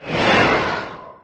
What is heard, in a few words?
superjump